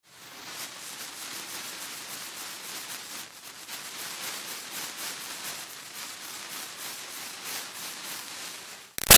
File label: Fire Sparkler Burns Sizzle Bys Moves Spinning; Fourth of July; BigRoomSound